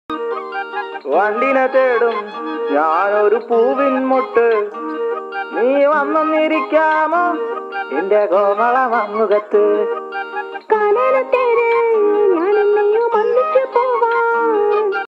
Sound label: malayalam music song